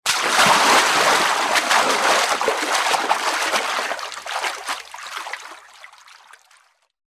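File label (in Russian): крупный всплеск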